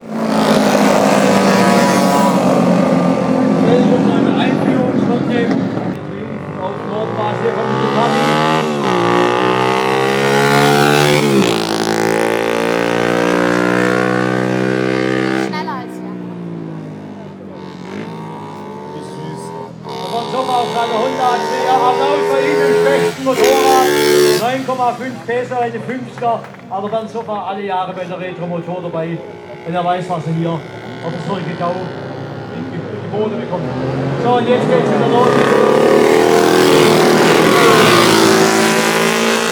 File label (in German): Retromotor alte Motorraeder